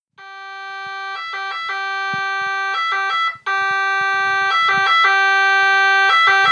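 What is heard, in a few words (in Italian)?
CITYPOL VFA, Sirena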